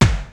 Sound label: DR-660 FX; Rubber Chicken Software Sample Bank